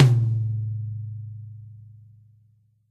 drum hit finish; osu!

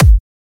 drum hit normal; osu!

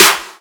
soft hitclap; osu!

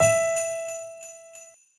soft hit whistle; osu!

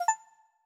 desktop toast short; steam; steamui

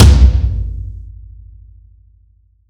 drum hitwhistle; osu!

